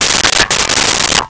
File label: Soda Can Getting Kicked Over; Crashes